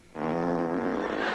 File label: Fart